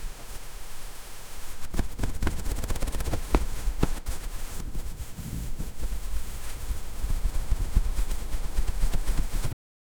asmr sound of rough fabric

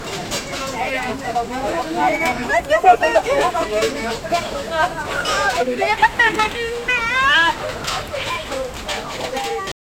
light market ambience wit, kids laughing

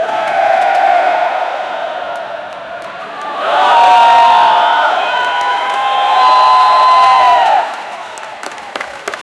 create a sound of crowd, concert

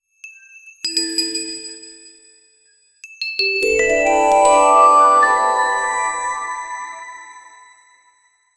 a long retro 8 bit 16 bit

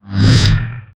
dark fantasy monster spaw

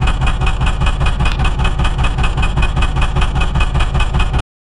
metal rattle

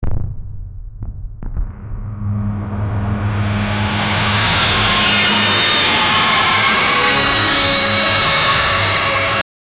thriller

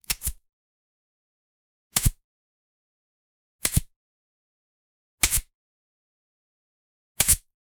blood magic ritual impact

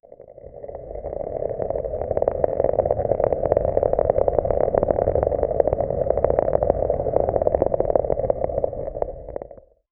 the sound of a crystal

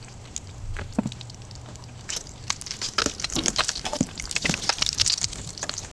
squelching parasites rapi; beetle

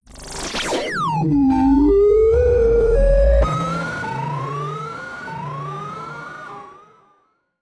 ghost groan moan

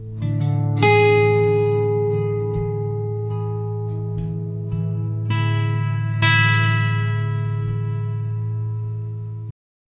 acoustic guitar strings p; musical instrument

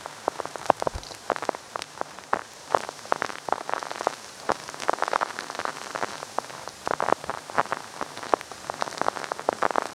muffled astronaut footste; suit

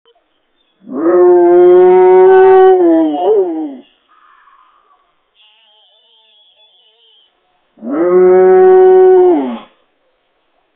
the sounds of a fight, bear

